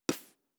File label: Mouth Puff